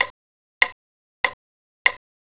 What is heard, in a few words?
clock